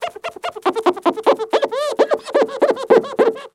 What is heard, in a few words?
household window cleaning wipe squeak fast